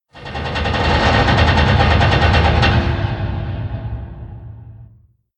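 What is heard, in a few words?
Random Ambience; moul; Eng Hut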